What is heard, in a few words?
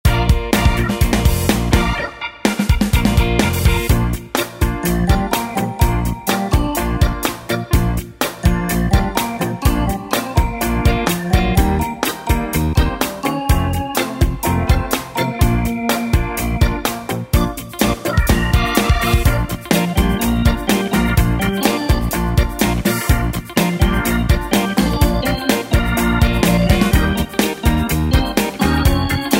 70s Pop Organ Beat